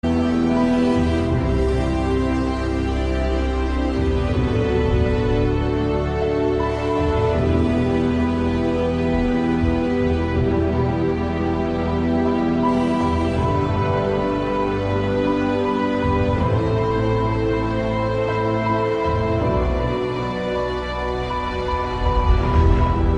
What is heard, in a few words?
ringtone; Mass Effect 3